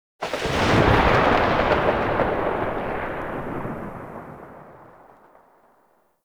Exalted Sniper Tail; Warframe; Frumentarius

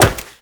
Wall Jump Metal; Footsteps; WallRun; Warframe; Characters; Tenno

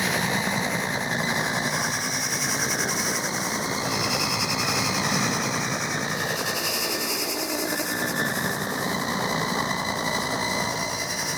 Mine Loop

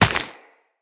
Run Dirt, Footsteps; Tenno

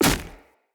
Sphere Polearm Medium Impact Wood Hollow Large; Player; Environment

